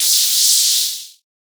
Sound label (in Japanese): 電流